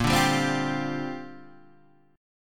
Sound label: A sharp 6th Add 9th A sharp, Guitar Open G; 8,7,5,5,6,5; down